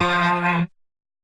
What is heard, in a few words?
Vox; Synthetic's Serum Collection; HYPERBITS